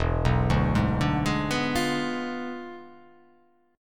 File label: E 7th Flat 9th, down